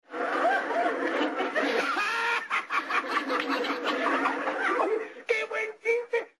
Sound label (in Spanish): risa; don ramon que buen chiste